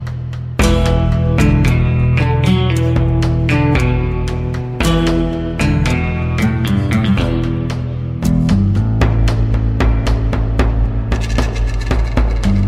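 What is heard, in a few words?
background music ringtone; Mirzapur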